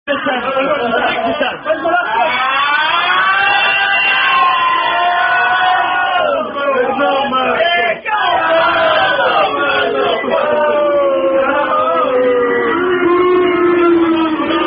Dirty Pint Drinking Tradition With Several Chants

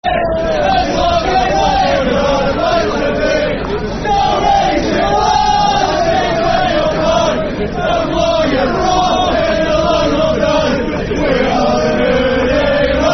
Football Chant; Bury; fanchants-com

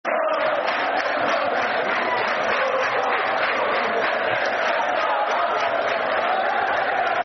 Football Chants (Soccer Songs); Stand Up If You Love City; Man City Mcfc

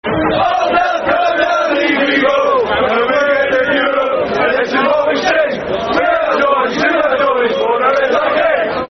Ei Ei E I O Football Chant (Soccer Song); Newcastle United Nufc

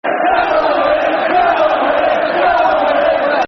Come On Reading, Football Chants; Reading Rfc

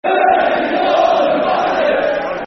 Football Chant; You Dirty Northern Bastard's; Swindon Town Stfc

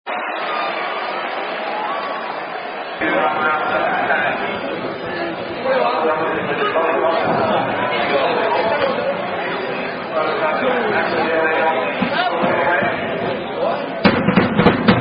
Football Chants

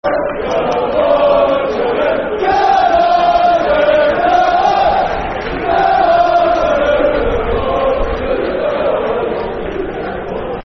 Come On Cheltenham (Slow), Football Chant; Soccer Songs; Cheltenham Town Ctfc

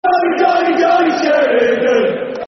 Football Chant (Soccer Songs), Oldham; Sheridan; Oldham Athletic Oafc

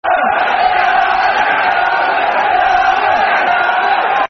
Red Army Football Chants (Soccer Songs); Middlesbrough

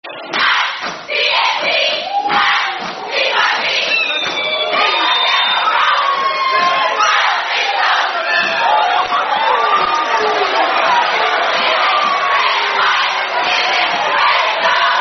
Sorority Chant; A Serious Matter; Alpha Kappa Alpha Aka Sorority Chant

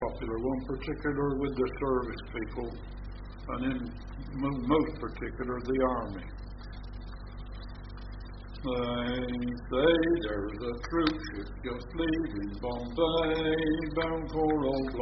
(Singer, Male)

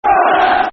Who Are Ya, Football Chants; Wolves; wolverhampton wanderers

